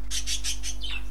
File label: Bird Chirp; Birds